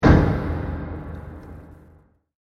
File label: Fantasy Cell Closes, prison door sound effect